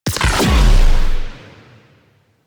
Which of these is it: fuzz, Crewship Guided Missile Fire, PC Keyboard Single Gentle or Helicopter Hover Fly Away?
Crewship Guided Missile Fire